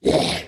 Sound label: stomper warcry, enemies; Factorio